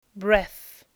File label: Breath